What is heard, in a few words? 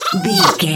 goblin fairy attack special